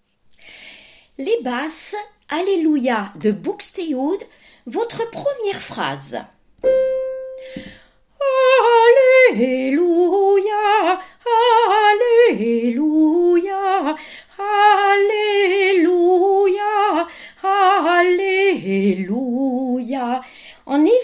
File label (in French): BASSE; BUXTEHUDE